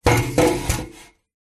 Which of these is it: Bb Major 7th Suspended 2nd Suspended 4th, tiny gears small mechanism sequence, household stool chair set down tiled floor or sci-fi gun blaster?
household stool chair set down tiled floor